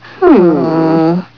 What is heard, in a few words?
multi groan; Simpsons